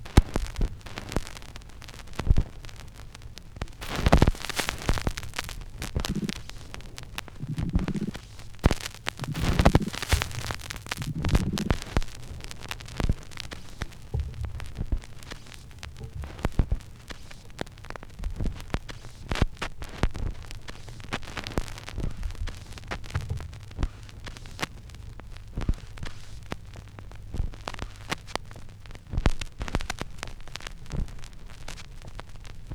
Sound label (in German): schallplattengeraeusche